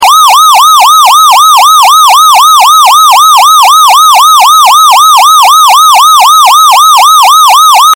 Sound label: YELP